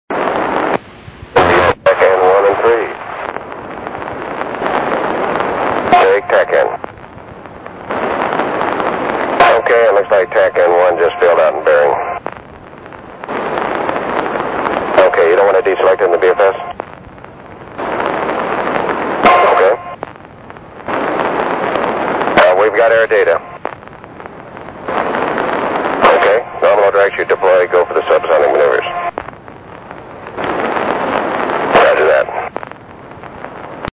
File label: space shuttle